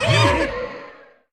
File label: Ghost; ChooChoo Heroes